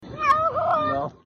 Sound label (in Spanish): gato me ahogo